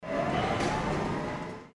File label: forklift truck